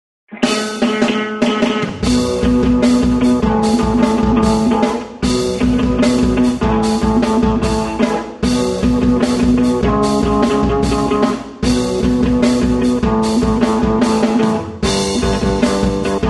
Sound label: song drums clean